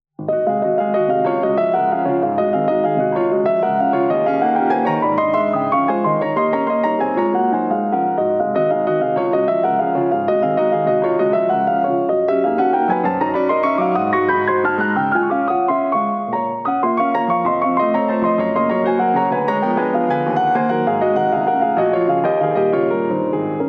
Etude, CLASSICAL MUSIC; Chopins; Op. 25 No. 8

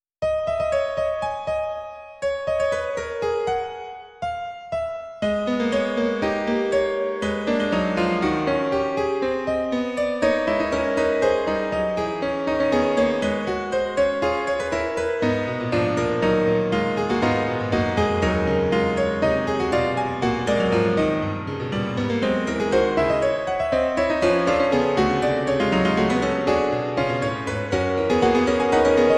Fugue For Piano In G Sharp Minor; Piotr Ilitch Tchaikovski

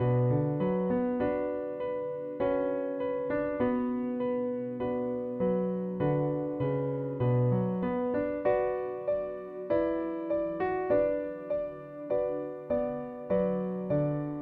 Prelude; Chopin

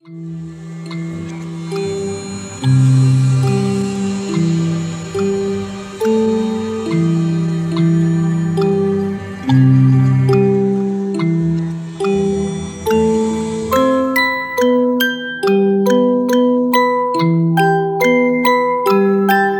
Music Box, cinematic music

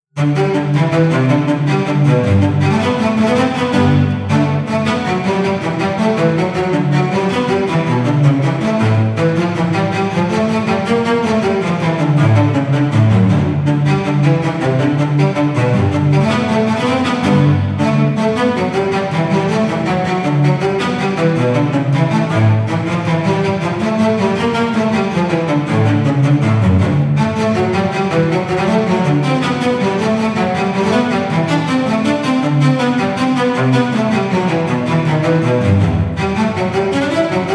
Suite In G Major Gigue; BWV 1007

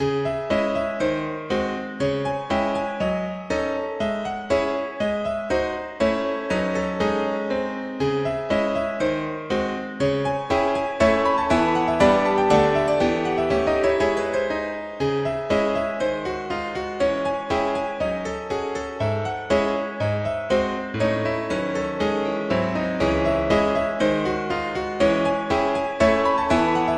Free Classical Music Nocturne; Piotr Ilitch Tchaikovski

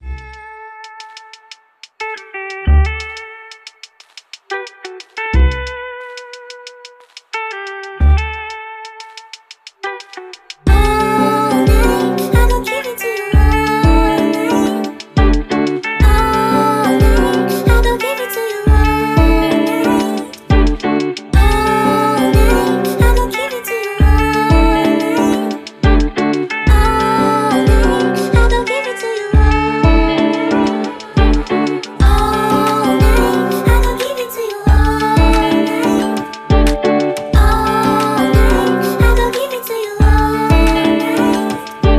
LOFI MUSIC

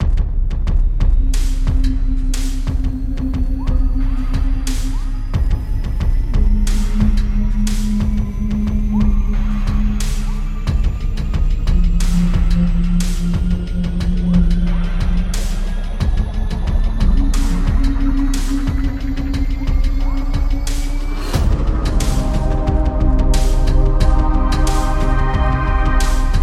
Cinematic Music

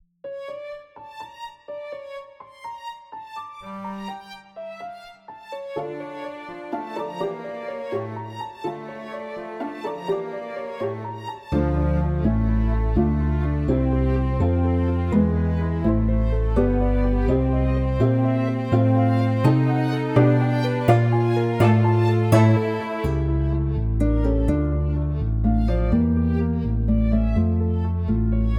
Lacrimosa, Classical Music; Mozart

